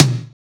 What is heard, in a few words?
Tom B; samples